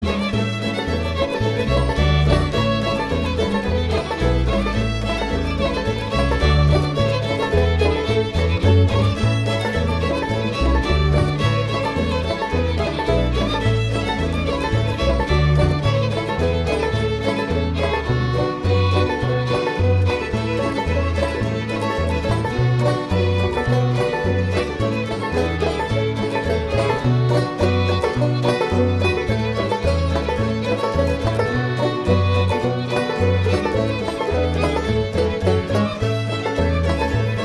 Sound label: Shenandoah Falls, Stringband